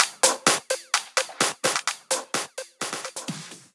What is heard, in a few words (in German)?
Drum Loop 128BPM FULL ohne BD